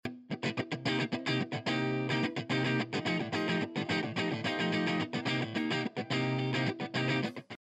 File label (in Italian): Chitarra Dry; RND 535 e 551; RND 535 e 551 ovvero il suono Shelford per la serie 500